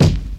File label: Kick